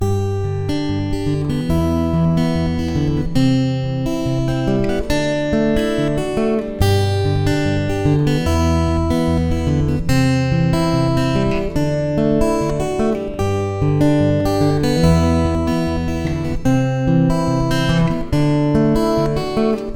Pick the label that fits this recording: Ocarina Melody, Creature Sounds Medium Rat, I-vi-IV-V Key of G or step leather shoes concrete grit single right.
I-vi-IV-V Key of G